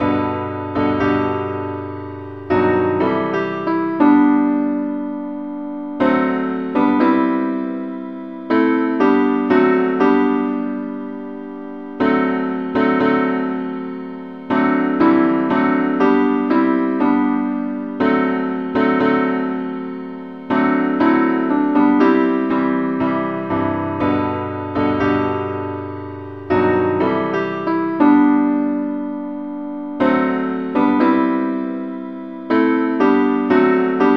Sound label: simple acc key g